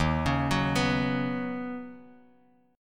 Eb Minor 7th Sharp 5th Eb Bass Standard; arpeggiated

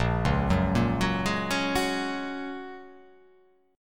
A sharp Minor 7th Sharp 5th, down

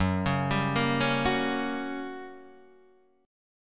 G Minor Major 7th Flat 5th F sharp

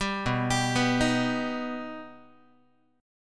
B Augmented B Guitar, chord; arpeggiated; JGuitar

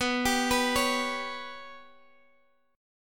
B Suspended 2nd Sharp 5th B, Guitar, Standard; Bsus2#5 arpeggiated; x,x,9,12,12,9; JGuitar

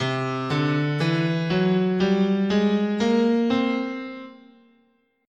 C Lydian Minor, scale

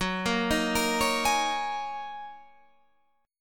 Gb 6th Add 9th Gb down Guitar Standard; arpeggiated; Gb6add9; JGuitar

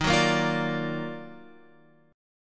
D sharp Minor Major 9th D sharp, Guitar; D#mM9 Chord {11 9 8 10 X 10} strummed

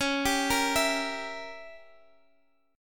Db Diminished 7th Db, chord

